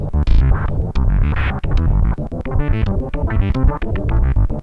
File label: bass; recreational research foundation